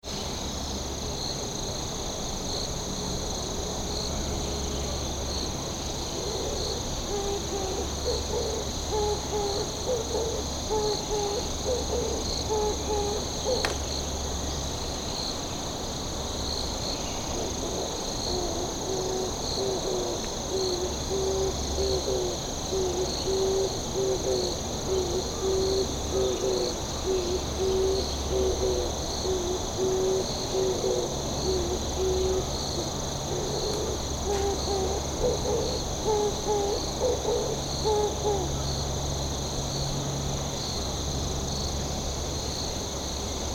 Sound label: turtledove